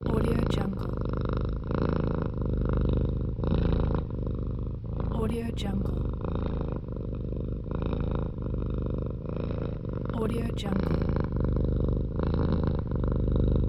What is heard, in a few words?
cat purring